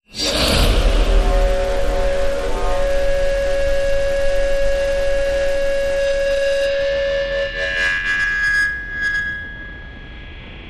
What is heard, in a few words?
hit; Hit Hor Solid State